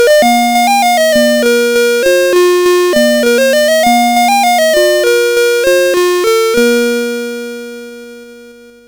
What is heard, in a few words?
sid CSG8580R5, GAMES; Alice in Wonderland